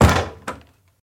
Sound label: Library Door Close